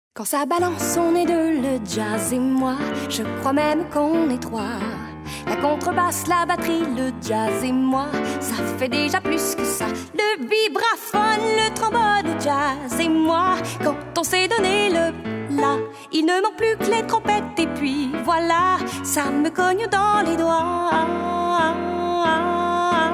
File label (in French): chant